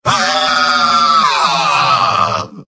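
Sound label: pain critical death; engineer; video games; source engine; tf; mvm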